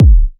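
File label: kick classic, drum samples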